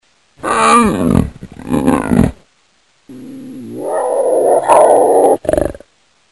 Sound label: leopard; animal sounds